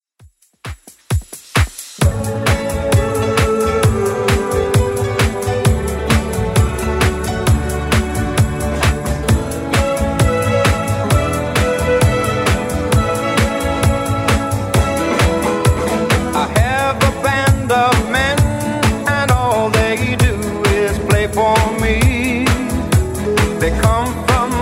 60s Redrum 132bpm

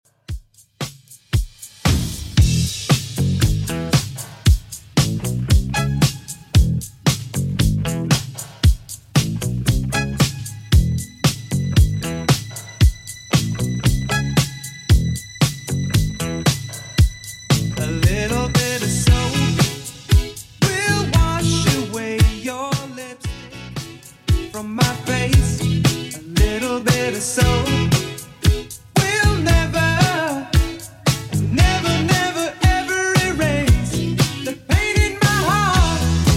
70s Redrum 115bpm

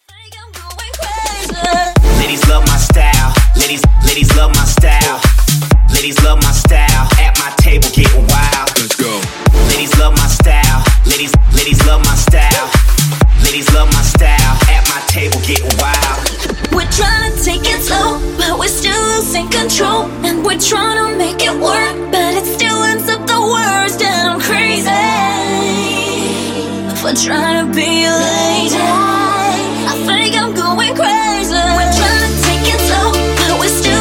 Hype

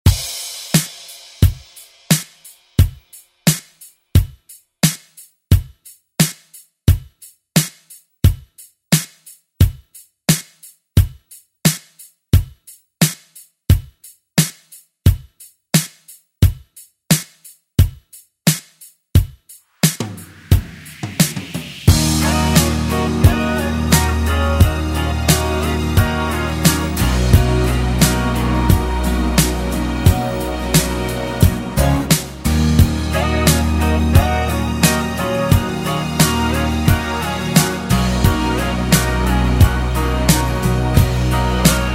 80s Re Drum; DJ Mhark

